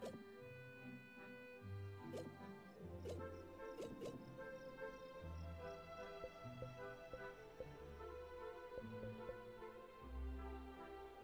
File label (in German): Inventar; spiritfarer